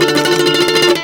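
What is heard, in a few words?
OCT GUIT MEL, OCT GUIT MELODY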